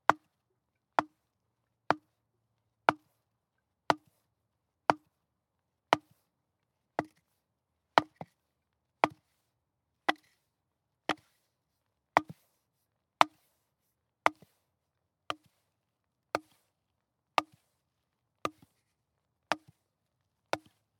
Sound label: Wooden Hammer Hitting Wooden Log